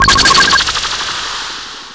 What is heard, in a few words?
pulse